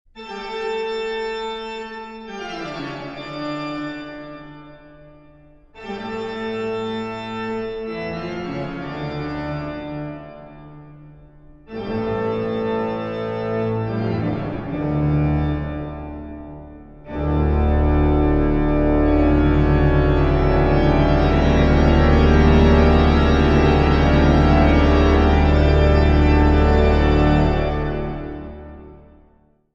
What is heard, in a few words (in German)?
Toccata